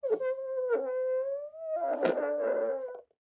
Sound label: Fart Whistle; SoundsCrate